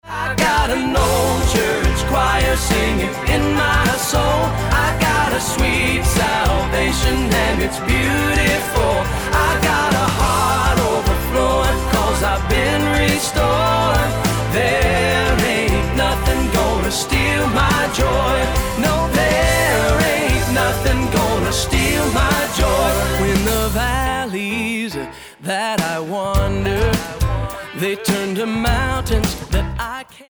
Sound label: old church choir